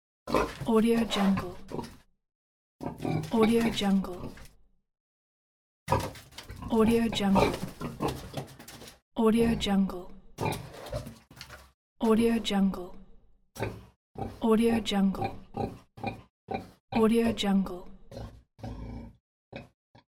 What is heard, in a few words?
pig